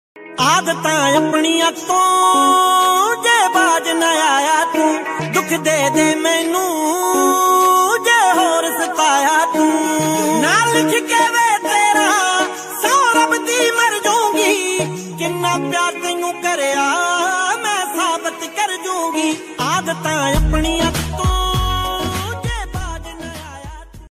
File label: Dance Punjabi Ringtone